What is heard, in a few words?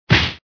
punch